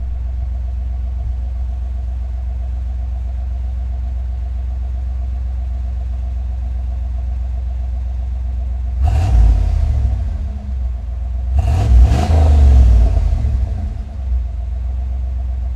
91 Firebird Idle Loop, Automobiles

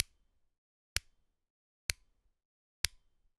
Generic Button Metal Tiny; boom library; The Interface

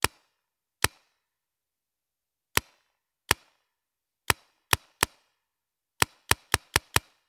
Paintball Gun; Sound Ideas; Guns

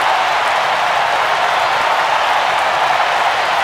BBall Crowd Cheer Loop; SFX Kit; Crowds

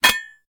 metal hit; firefight

